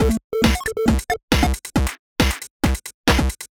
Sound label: Arcade Chiptune Loop 128bpm